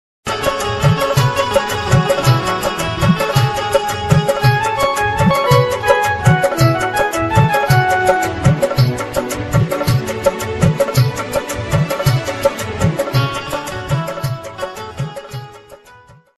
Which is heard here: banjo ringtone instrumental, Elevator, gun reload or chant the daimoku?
banjo ringtone instrumental